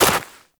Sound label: Walk Snow, footsteps; Warframe; Characters; Operator